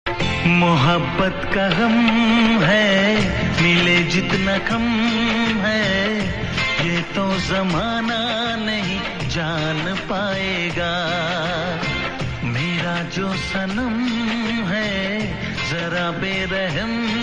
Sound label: Old hindi Ringtone